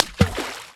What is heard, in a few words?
object water